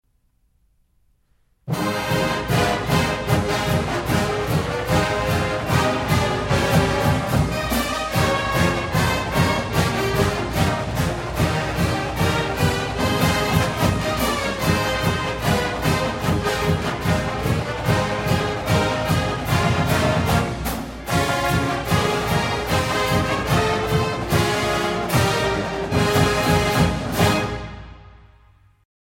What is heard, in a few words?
Marching Band, Victory March; Javelina